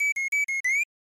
Tile Match (Chirp); Shanghai 2 Dragon's Eye; snes

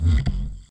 death

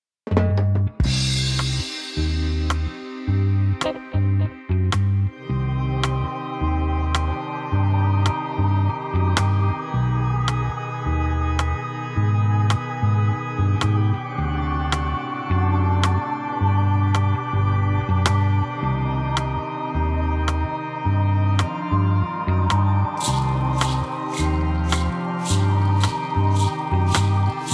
Bass; Return to Monkey Island

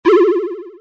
tongue sound

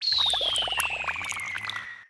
Ball Sounds - Fly; pangya fantasy golf pc

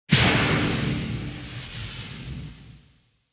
Enter Atmosphere; Angry Birds Space

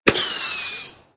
Rocket Launch

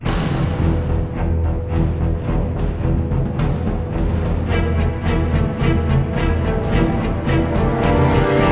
Crisis In; Granblue Fantasy Versus

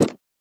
Crumble Block Touch; A Robot Named Fight

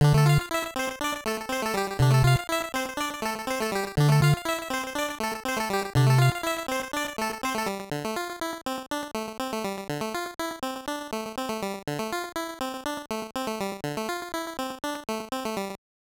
Time Freeze (Pause Game); Wizards & Warriors III

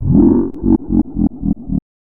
Laughs; Ganon

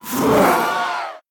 Ghost Pain; Devour; The Town

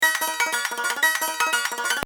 Chime

